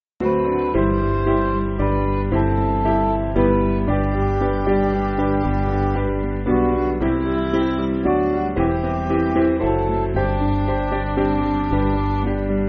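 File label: Midi, Backing Files for Churches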